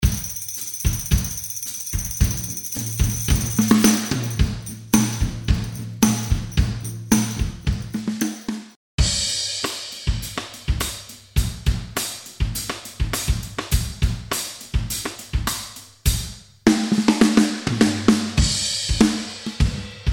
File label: classic soul